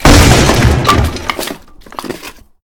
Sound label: wood break; turkmusic